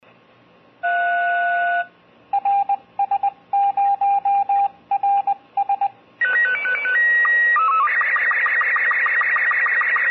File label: SuitSat SSTV; ARISS